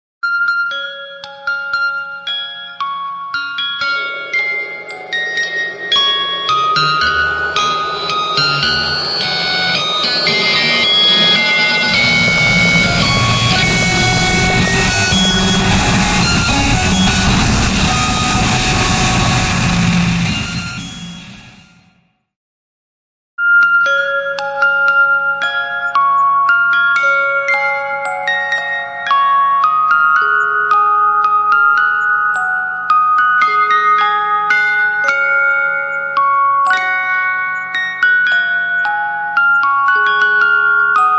Music Box Torture; Epic Tools vol. 1

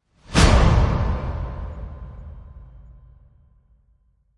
Hell Drag Whoosh; Gothic Storm Music

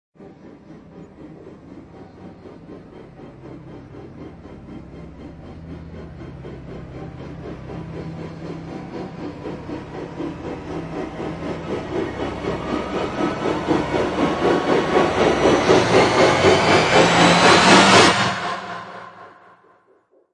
Rise Timebomb; Brand X Music; Brand X Music Catalogue - Editor's Essentials

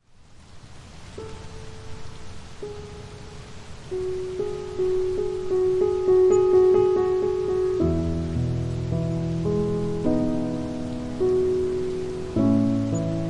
(With Rain), Piano

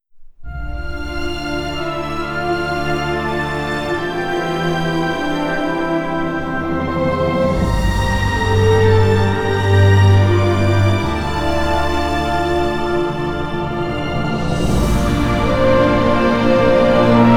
Wind Swept, Cinematic Emotions & Drama; Liquid Cinema

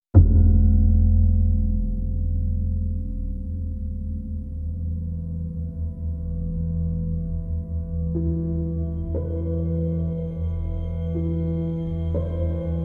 Generator; Ninja Tracks

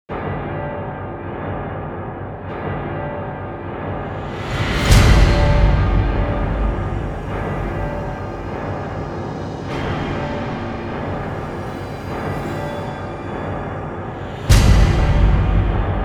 Drums Only Version

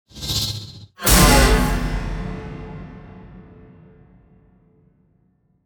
Impacts Demolition; Gothic Storm Music; Advanced Sound Design 2012

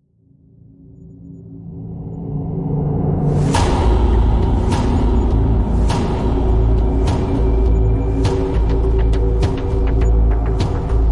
No beat; Ninja Tracks

